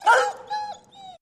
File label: Shepherd Dog Yelp